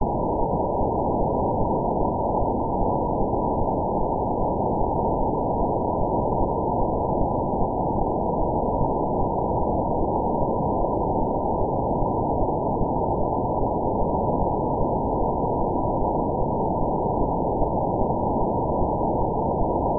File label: synthetic pulsar